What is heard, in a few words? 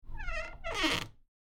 door squeak; fa battlemaps